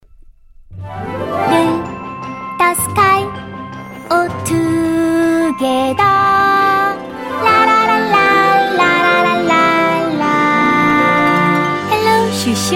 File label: Rule song vocal; Rule the Sky 3rd Anniversary